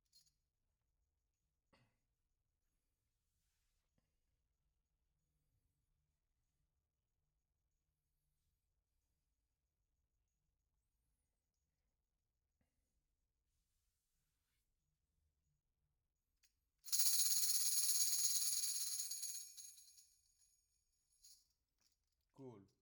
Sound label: Tambourine